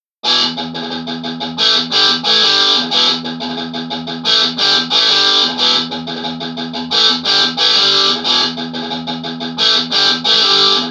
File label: impulse